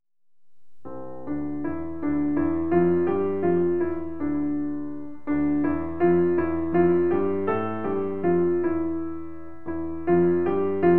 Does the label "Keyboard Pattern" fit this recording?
Yes